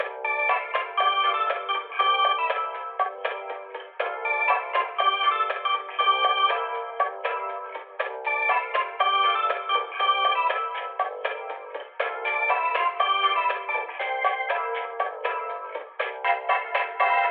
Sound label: Elevator Music; soma gamerip 2015